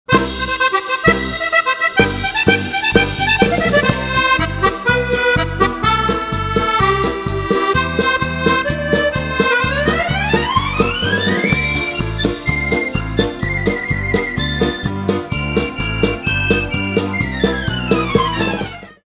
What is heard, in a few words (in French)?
c'est le piano à bretelles